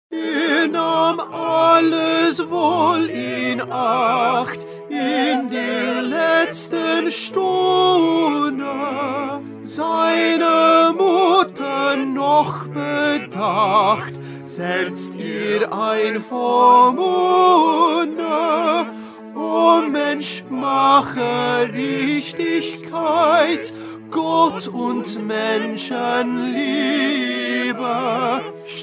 Tenor; Bach; St John Passion

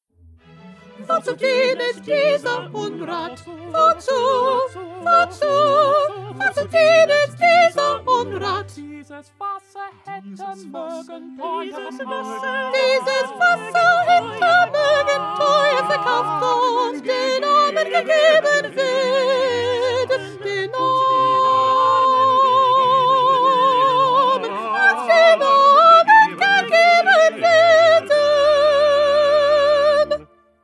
Soprano

